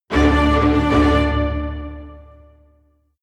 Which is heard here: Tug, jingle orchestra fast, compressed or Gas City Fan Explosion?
jingle orchestra fast